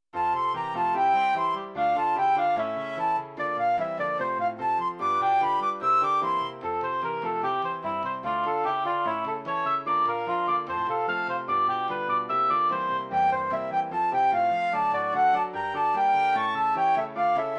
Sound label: Valence Tones